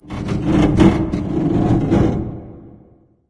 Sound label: lift creak; ambient; source engine; ep2; outland